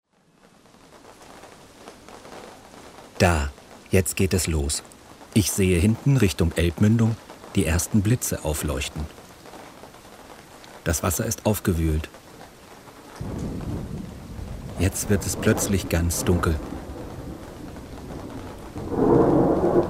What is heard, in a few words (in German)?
regen gewitter; hoerreise